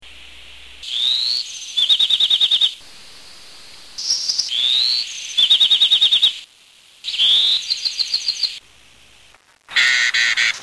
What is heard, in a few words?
Bewicks wren